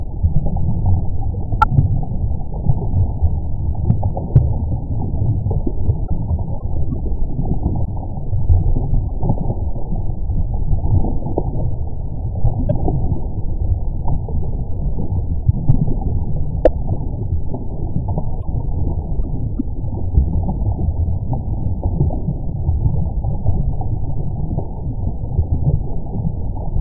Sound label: WATER; pictcode